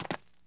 land, dirt, foot; The Elder Scrolls III Morrowind GOTY; XBOX

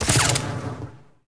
projectile absorbed; impacts explos

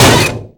Medium Impact; Totaled; XBOX

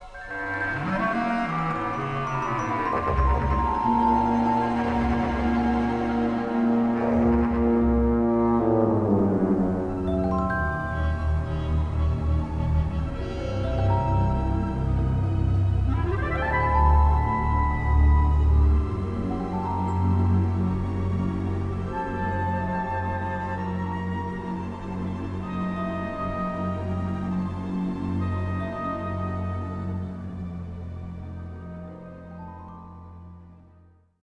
suspense, music

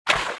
water run, footsteps

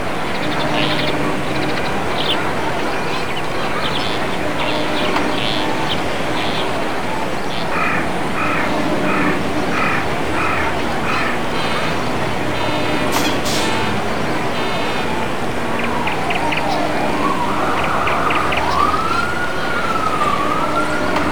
env city; Rally Fusion Race Of Champions; XBOX

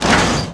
houses garage door; Tony Hawk's Project 8; XBOX

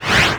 value up; Decors

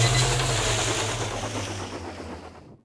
Mixer end, machines mechanical

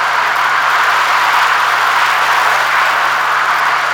Skid Gravel; Totaled; XBOX; skids

